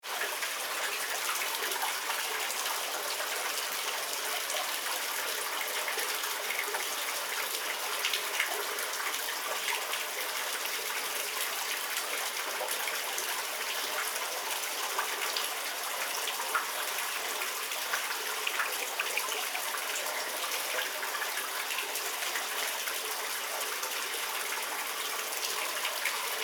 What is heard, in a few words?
Ai Giannis Springs